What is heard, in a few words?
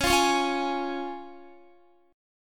Db Major Db Guitar; strummed; x,16,17,18,17,16